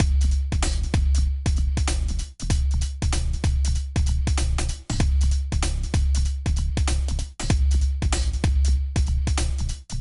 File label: Beats N Bops; Littlest Pet Shop Garden Jungle Winter; NDS